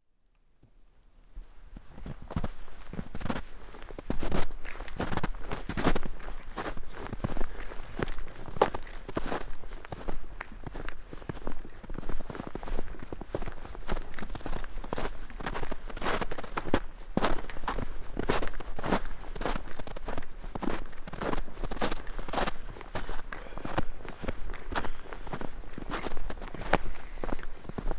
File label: footsteps through fresh snow